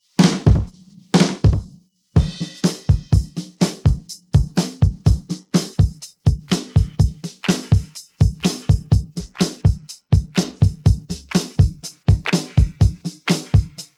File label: pattern, drums